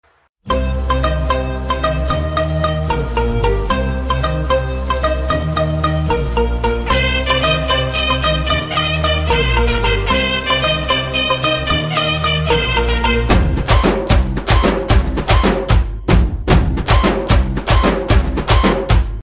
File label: Starting Musik Tone; Raja the Great; Telugu